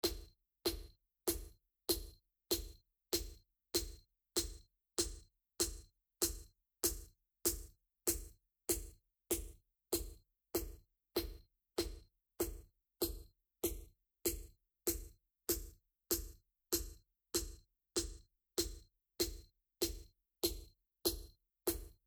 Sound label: Snare